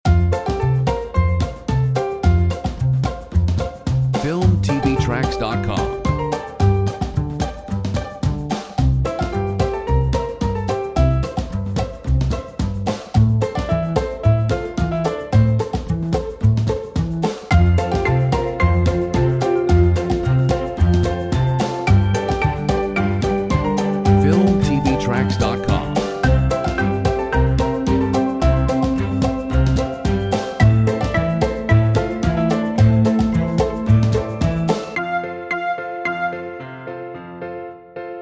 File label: Quirky; Filmtv-tracks